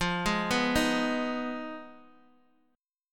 F Minor 7th Flat 5th, Guitar, Open D

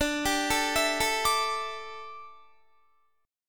D Suspended 2nd Suspended 4th D down Guitar

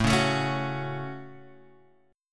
A 6th, Guitar, Open F; strummed

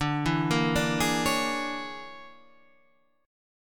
Bb 7th Sharp 9th Flat 5th D

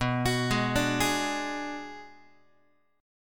B Minor 6th B, Guitar; Bm6 arpeggiated; x,7,9,6,6,7; JGuitar

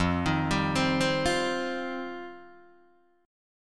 F 7th Suspended 2nd F Guitar Open D

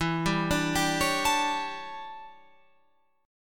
E Minor 13th guitar chord, Open F; Em13 arpeggiated; JGuitar

